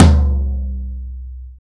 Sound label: high tom drum single hit c key, Royality free tom drum tuned to the C note.